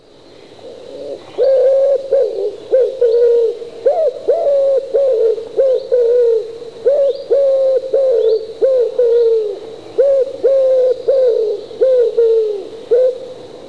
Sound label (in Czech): holub